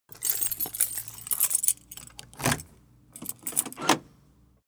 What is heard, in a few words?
car lock keys